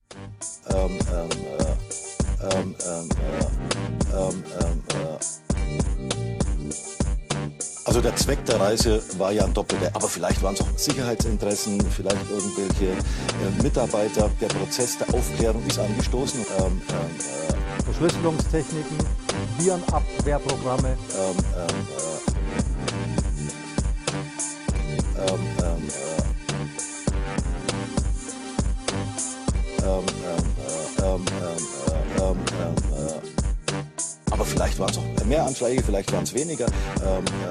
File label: hiphop remix; nsa